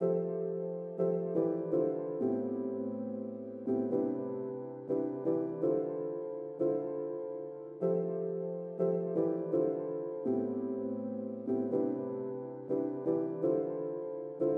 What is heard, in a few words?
Piano 123BPM Fmaj Warm Cosy